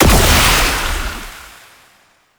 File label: Incarnon Wrist Gun Alt Impact, Weapons; Warframe; Tenno